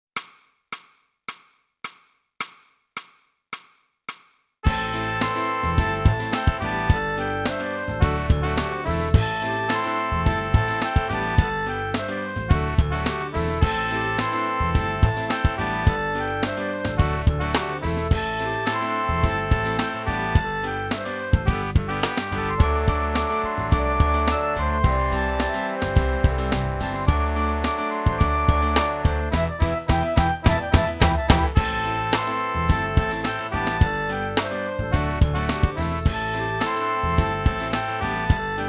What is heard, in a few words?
sax